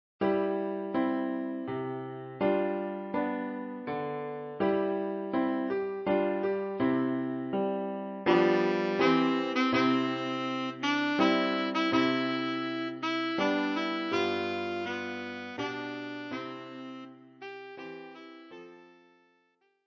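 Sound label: alto saxophone; O Tannenbaum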